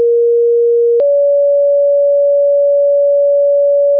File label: pager tone